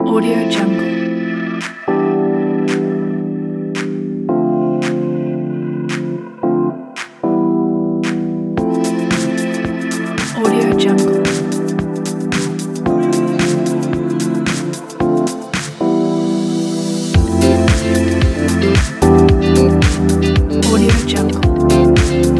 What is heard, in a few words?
deluxe fashion soul